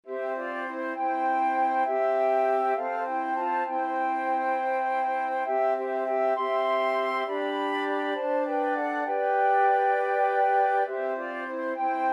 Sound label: flute quartet